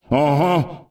ah huh

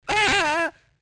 aah

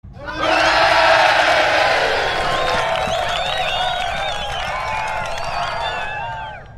cheer

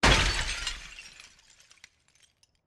shatter

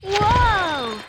woah

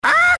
parrot; Animal Sounds; Various Animals; Short calls

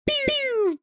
pew pew

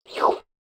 slurp

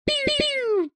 pew pew pew

